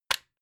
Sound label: plug plug in single